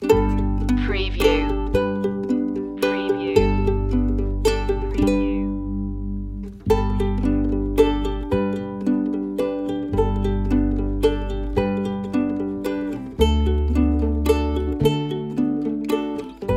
silent night ukulele underscore

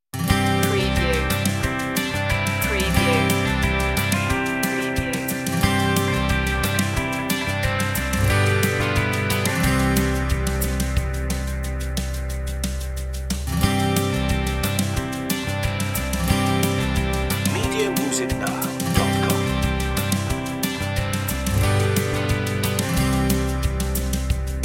row row row your boat instr version no sound-fx

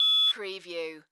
Multimedia Beep

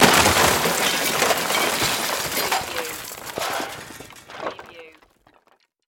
Crash Debris

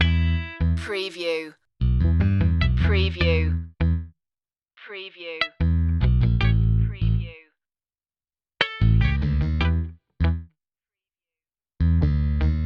latin flavor loop

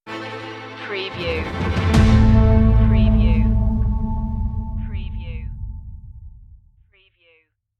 storm stinger

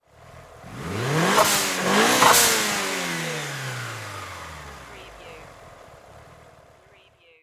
MITSUBISHI EVOLOUTION ENGINE DOUBLE REV

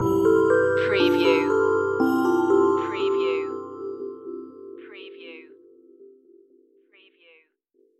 fairy dream magic stinger

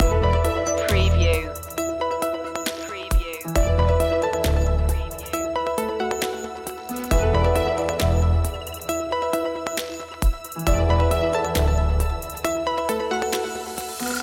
digital environment loop

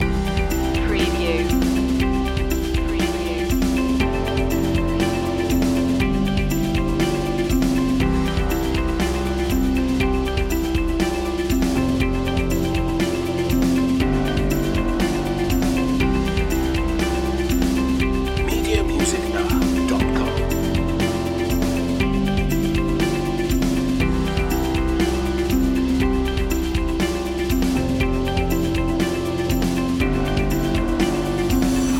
corporate innovations loop

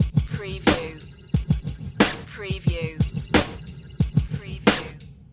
Hip Hop Drum Loop 90 BPM - Without Crowd

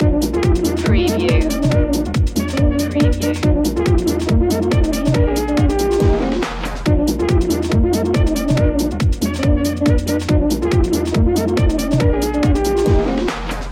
shuffle kerfuffle loop

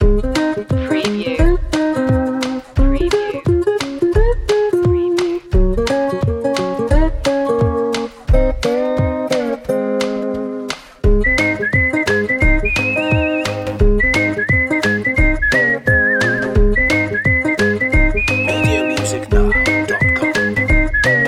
whistling dreamers

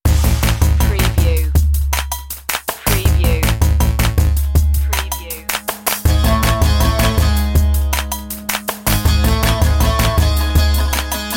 eastern pulses

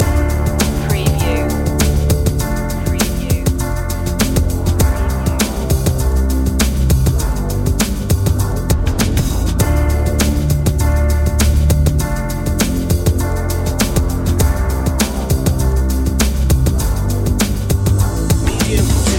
tribal trip hop loop

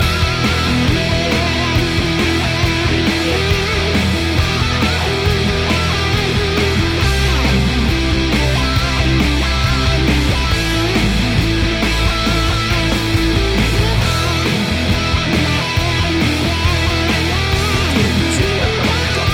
hot rocker loop

